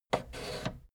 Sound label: fader plastic scrape single down halfway slow